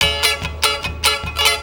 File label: TAMB, Gipsy; Best Service; Ethnic Journey Sound Cube